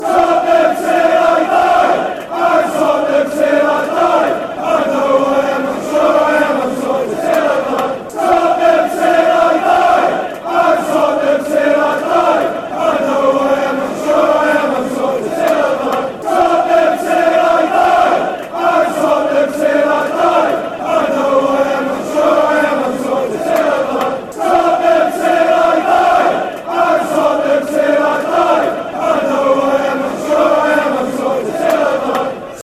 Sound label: tottenham till i die, fanchants